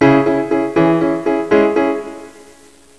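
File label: PIANO ARPEGGIO C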